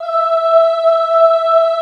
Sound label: OHH E4, Classical Choir; Best Service; Extended Classical Choir